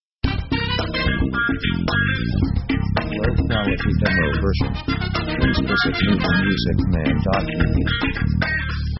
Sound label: pop 70s funk sketch